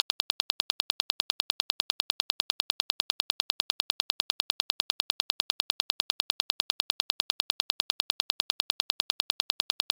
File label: click train 10hz